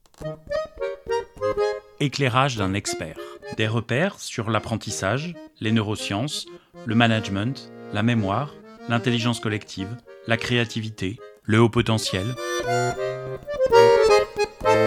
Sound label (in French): jingle